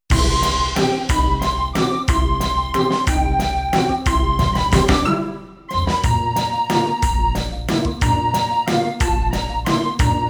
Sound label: Waltz; puzzle bobble variety